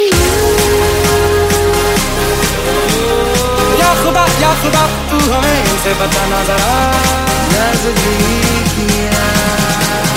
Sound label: hindi dj mix music ringtone